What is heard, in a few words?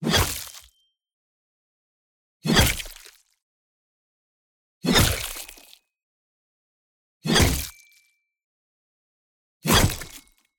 Long Two-Handed Axe Flesh Hit; Melee Weapons; Justsoundeffects